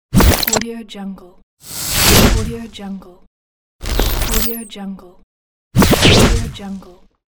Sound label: glitch transitions